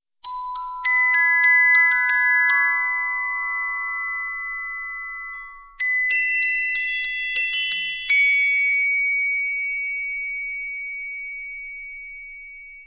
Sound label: Glocs